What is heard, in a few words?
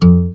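GUI Ac Dmp F, acoustic guitar; Best Service Gigapack 2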